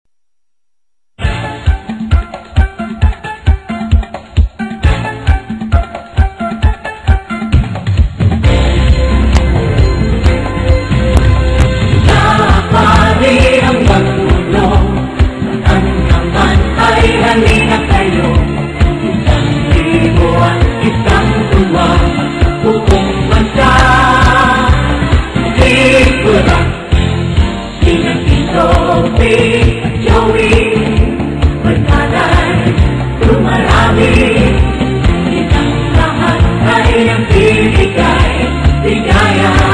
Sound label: theme song